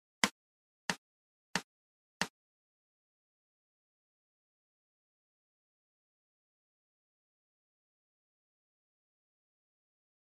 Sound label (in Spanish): Voz Principal Mujer